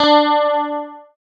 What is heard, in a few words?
GUI, E-Guitar; Sound & Vision; Gigapack I CD 2; GUI E-GUITARS; GUI E-Guitar 1